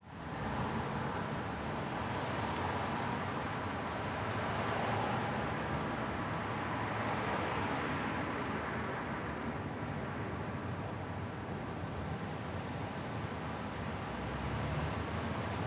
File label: Traffic Intersection; Contra Costa Chilpancinco